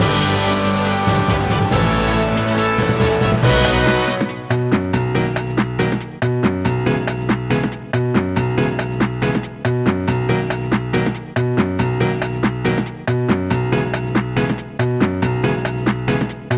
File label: Goal Theme; Namco Museum Megamix; Pac 'N Roll